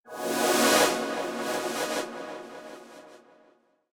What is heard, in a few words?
Jingle Treasure Room